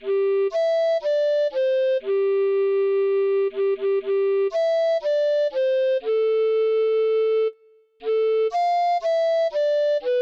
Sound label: Jingle Bells, alto recorder